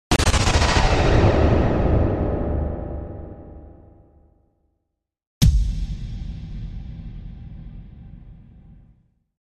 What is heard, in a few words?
Steel Echoes